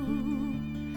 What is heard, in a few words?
Vinyls Impulse Small